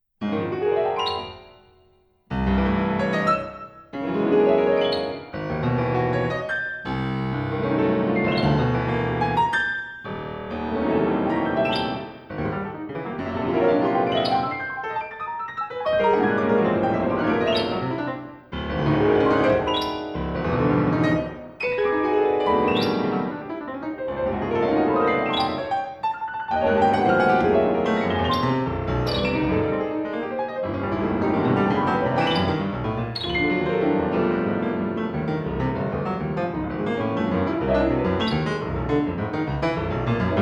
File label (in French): 2 pianos